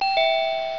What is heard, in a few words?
doorbell ringing